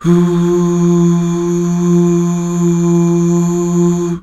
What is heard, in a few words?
UUH